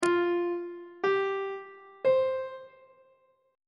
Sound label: f suspended 2nd triad chord intervals on treble clef